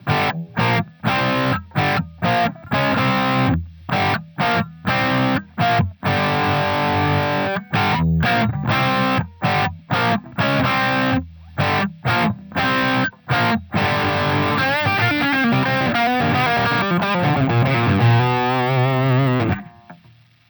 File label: lead bridge; custom77 cs4